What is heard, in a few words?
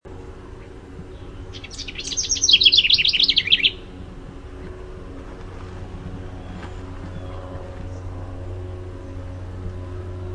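House Wren song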